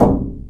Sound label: glass sheet impact soft; physics